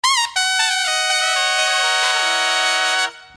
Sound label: brass loop